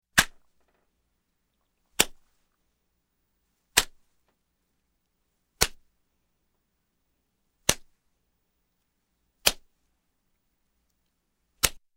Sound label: Punch, Face Single Punches With Fist, Human; Exercise; Sound Ideas 2000 Series